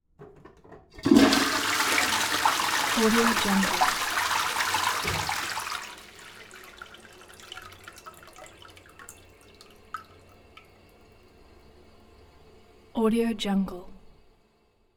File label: elements water toilet flush long; smartsoundfx